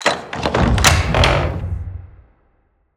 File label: Designed Doors, Old, Wooden, Scary, Horror, Open, Creeking; HAUNTED DOORS